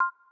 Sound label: Play Pause; samsung YP-U5; Audio User Interface